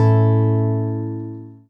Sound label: Traditional Guitar 098bpm A end, African Guitars; Quazibeat - African Secret